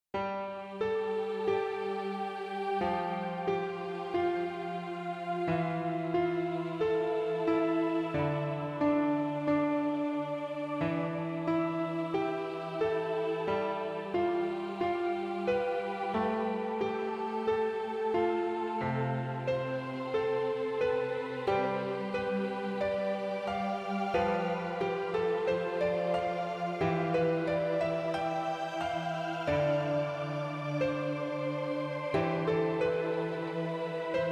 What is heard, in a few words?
Calm Mood; Undersource OST